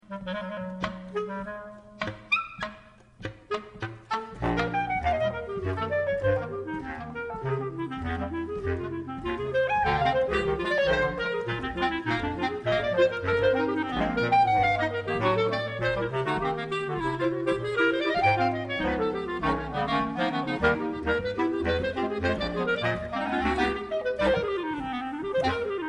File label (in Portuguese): clarineta; instrumentos